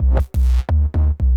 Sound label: 175 D&B Synthbass Bbm; Dance DJ